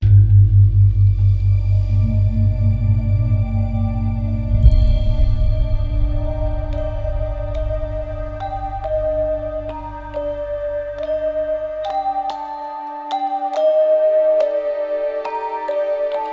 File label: Gamelan; Summoning Taro; Kena Bridge of Spirits